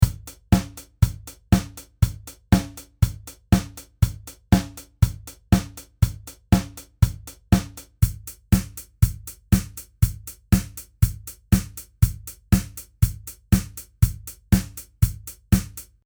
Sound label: Drums